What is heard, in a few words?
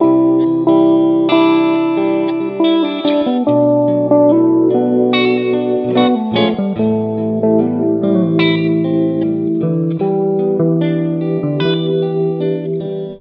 guitar and keys, funk